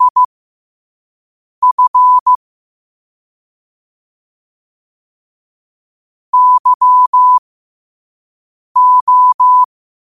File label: 5 wpm, morse